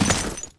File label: jump dirt